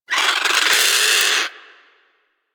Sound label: Avichaea Vocal Alerted; Warframe; Hunting Critters